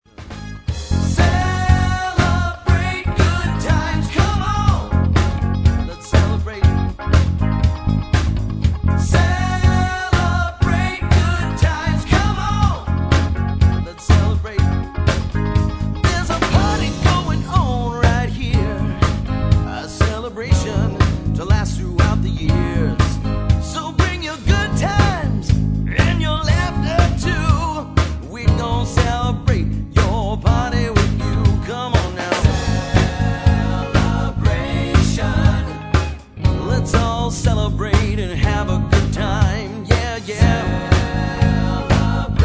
Cover Band

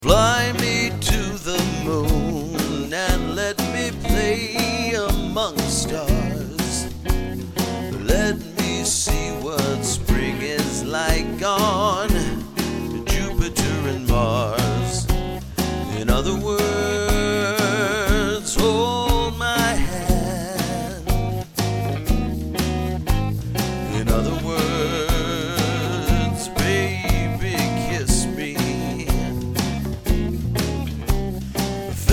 Cover Band

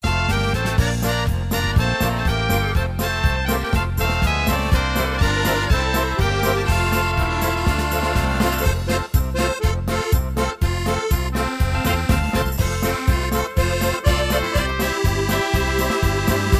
Octoberfest Polka

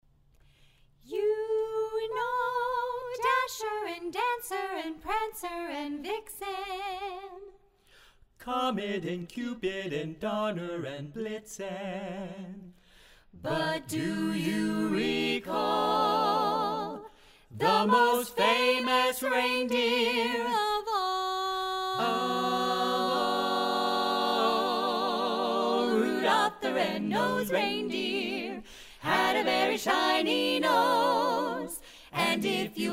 Christmas Carolers

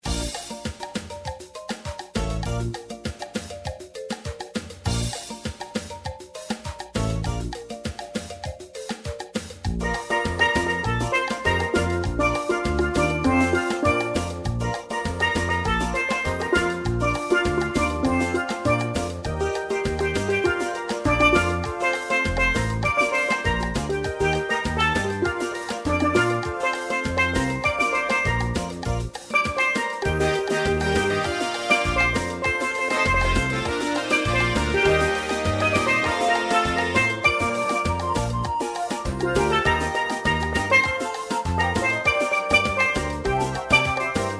Steel Drum Player; Boston

